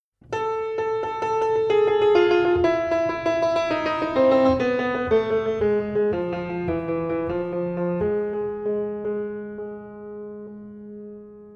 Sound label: Oriental World